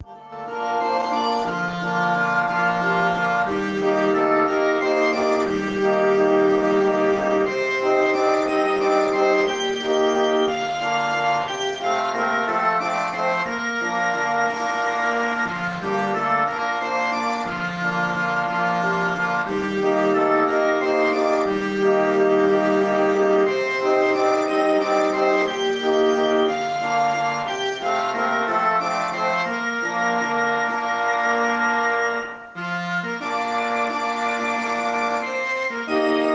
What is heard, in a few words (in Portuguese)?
instrumental; Salve Santana Ditosa